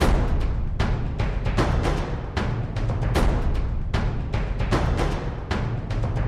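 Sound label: drum loop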